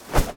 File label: Pirate Birdnest; gamerip